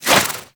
Tesla Ball Toss; Trapper

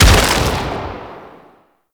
Brawler Rock Punch Impact; Warframe; Warframes

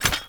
Footstep Default; Warframe; Hoplite

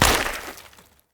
Hunter Bow Ranged Arrow Shatter; Weapons; Player; Bows; EnvoyHunterBowRangedMelee

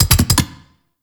Wall Climb Cast Step Twirl; Warframe; Oraxia

